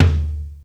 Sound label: Toms, Real